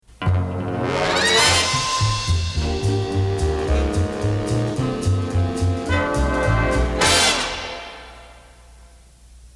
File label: Sparkling Sound Inst; CKWX; 1961; Anstey Collection